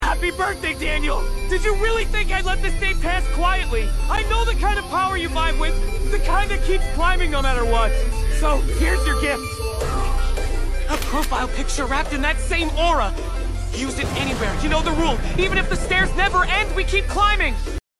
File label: happy birthday daniel